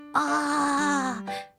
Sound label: ahhhh